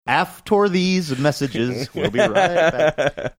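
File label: singing after these messages we'll be right back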